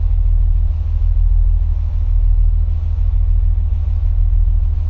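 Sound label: Valentine engine interior rpm load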